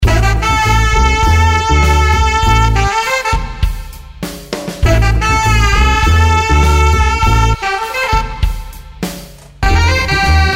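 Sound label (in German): Bass, Preset „Creamy Smooth Bass“; Upright Bass; Waves Abbey Road Studios Reel ADT; Ueberschall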